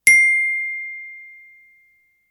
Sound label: ding